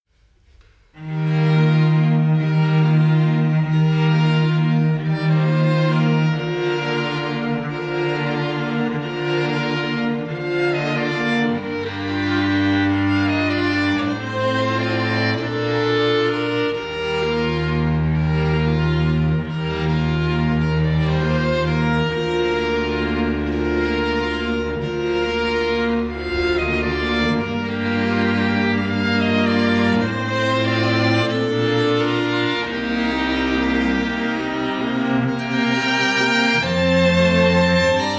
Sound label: String Quartet; Manchester String Quartet; Many Strings Quartet